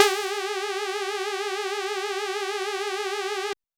Dirty Dutch Lead G2; Vengeance Electroshock Vol.2; VES2 Dirty Dutch Special; VES2 Dirty Dutch Leads

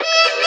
E stab; funky; Loopmasters; Funky Underground Vol 2

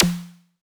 Perc, Dubstep One-Shot Drums

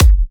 Bassdrum

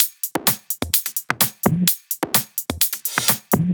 Loop, 128 BPM

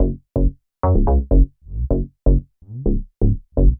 126 F# C# BASS, Funky; Loopmasters; Funky Underground Vol 2; BASS LOOPS

